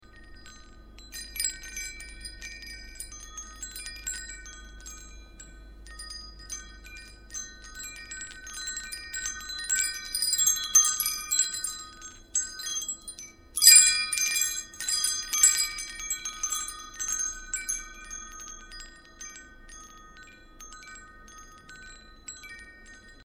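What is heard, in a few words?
wind chimes middle; percussion veterok middle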